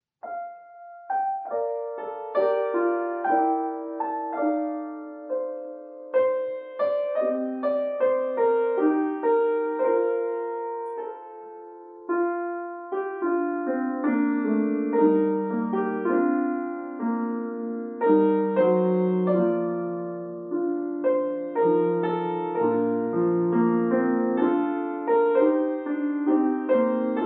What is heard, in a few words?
Piano; Larice Music; Rock of Ages